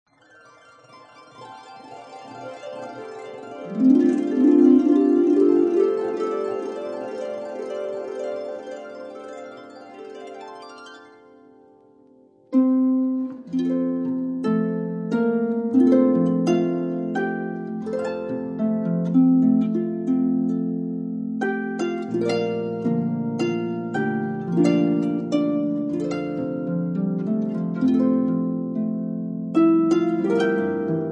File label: Harp Hymns